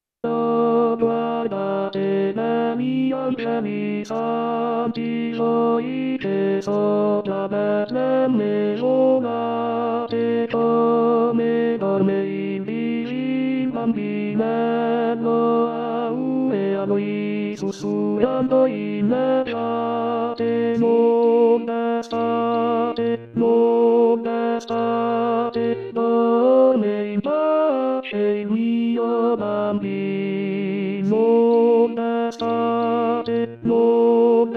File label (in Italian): Tenori; Oh Guardate Begli Angeli Santi; natale